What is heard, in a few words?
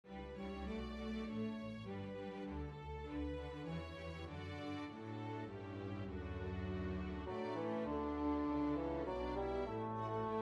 Mini Bassoon Track with Melody; The First Nowell